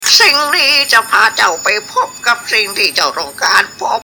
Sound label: cartoon